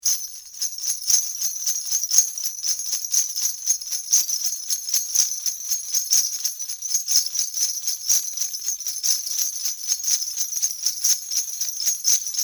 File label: Sleigh Bells; percussion; Neumann KM84